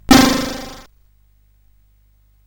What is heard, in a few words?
sid CSG8580R5